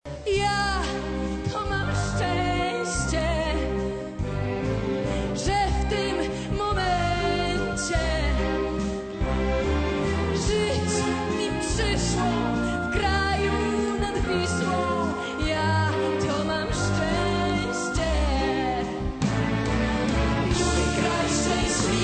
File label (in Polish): tango na glos; wezwanie do Spiewania